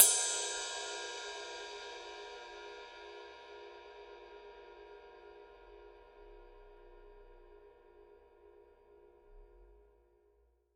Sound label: 22 power ride body, paiste 2002